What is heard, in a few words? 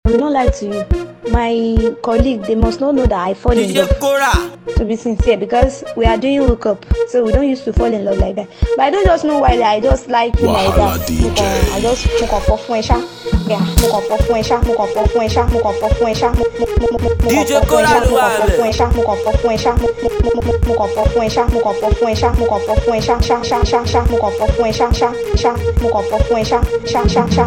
Cruise Beat